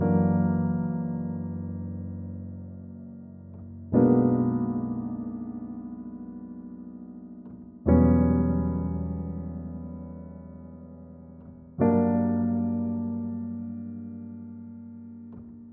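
122 BPM Piano Chord Progressions Cinematic Drama D major B minor Tonic F; MMB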